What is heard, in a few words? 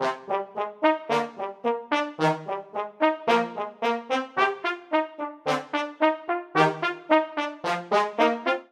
Ostinato Gentle Finale i-v-VI-VII C Minor 110 bpm, Brass Quartet; MIDI Clips; Ableton; Spitfire Audio